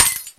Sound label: Glass, Yamaha TG-33; Drum Samples